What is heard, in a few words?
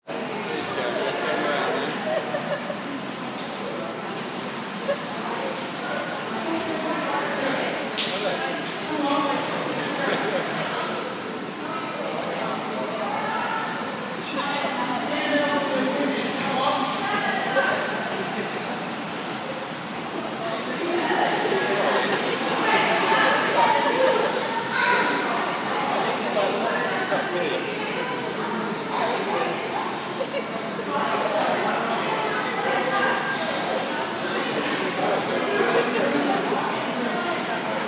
swimming pool ambience